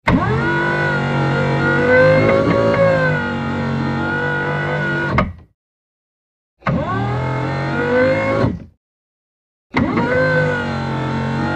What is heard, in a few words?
Electric Motor; Lift 2; Four Large Electric Motor Lifts; Sounds Like Fork Lift, Close Perspective.